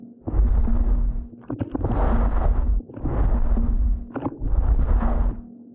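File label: space dragon moving loop; Stellaris; reanimated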